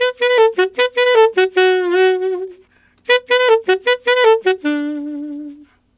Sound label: Alto Polka in D maj, Sax; Sonic Foundry (Sony Creative Software)